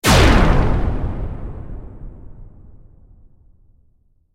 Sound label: Laser cannon